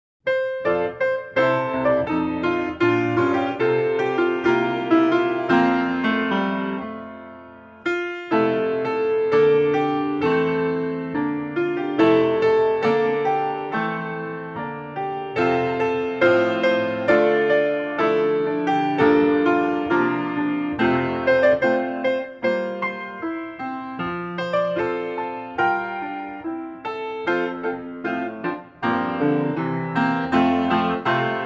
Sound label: accompaniment; melody time